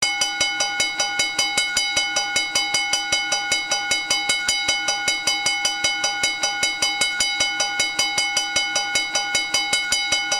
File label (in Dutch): overwegbel laag snel